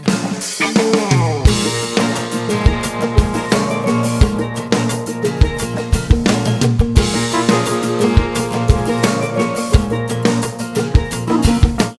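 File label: sms in country style; sms ka v stile kantri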